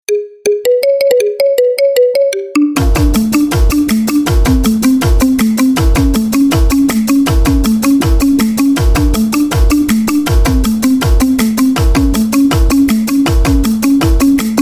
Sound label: marimba remix; gravity falls